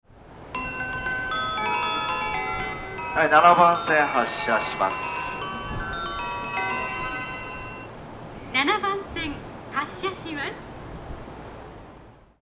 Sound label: melody, station; abiko; joban; jreast